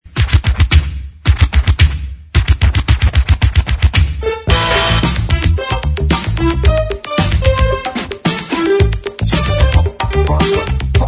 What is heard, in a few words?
breakdance; music